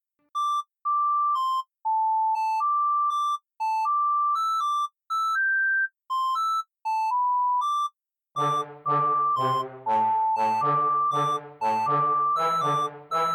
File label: morse cryptogram